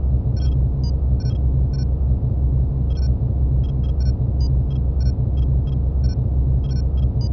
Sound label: sickbay amb; trek